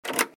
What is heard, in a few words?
Open